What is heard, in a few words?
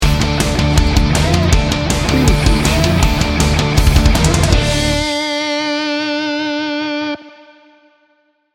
power punch sting bumper